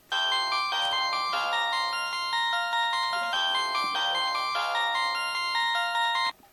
samsung sghe700 ringtone

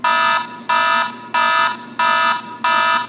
alarm ringtone; apple iphone 3g 4

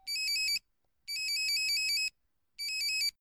motorola startac ring style ringtone

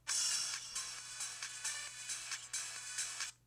motorola krzr k1 ringtones

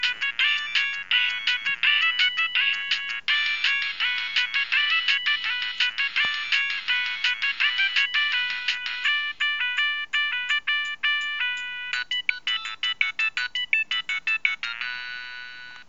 nokia 3510i skydiver ringtone

